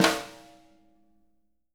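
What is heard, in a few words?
jazz snares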